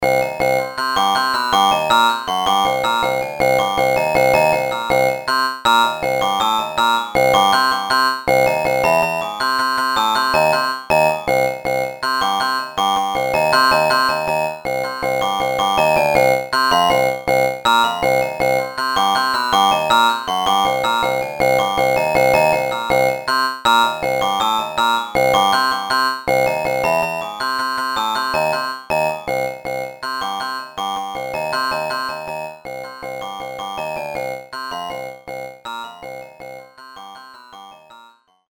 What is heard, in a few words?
Buzzpluck 80bpm fade